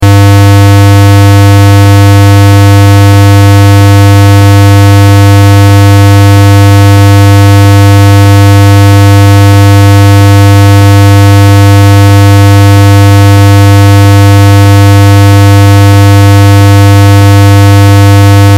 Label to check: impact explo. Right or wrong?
Wrong